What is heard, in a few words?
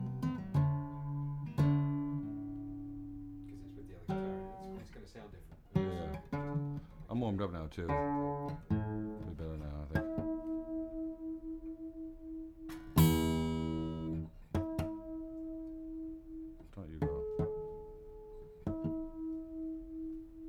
B FORMAT guitar tuning; Ambience